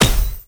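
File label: element staff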